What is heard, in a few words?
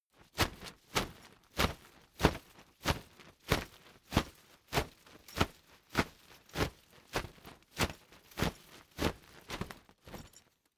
umbrella big shake slow hard